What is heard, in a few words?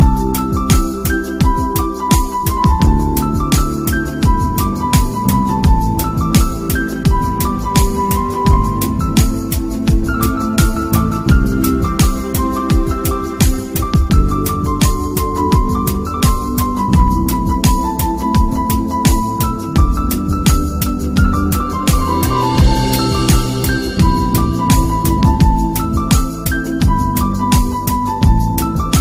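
Piano Instrumental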